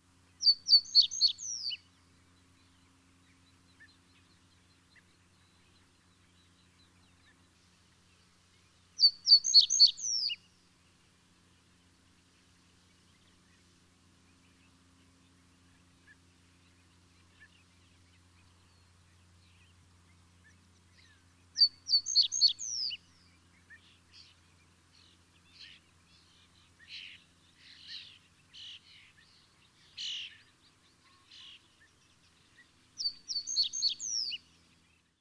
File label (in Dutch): rietgors